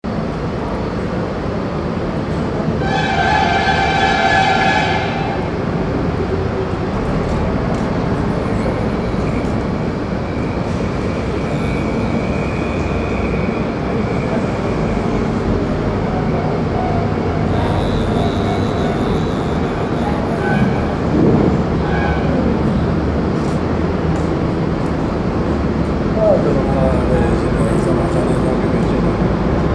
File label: new delhi urban mantra